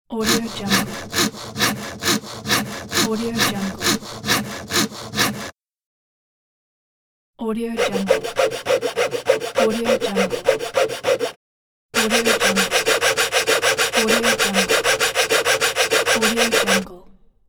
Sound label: handsaw